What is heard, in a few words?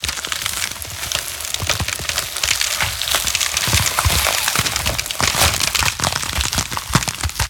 Ice Stress; Rapids